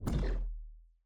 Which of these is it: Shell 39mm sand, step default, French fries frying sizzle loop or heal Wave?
heal Wave